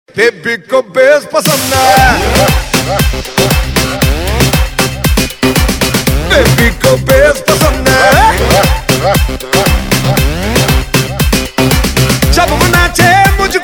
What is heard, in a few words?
Bass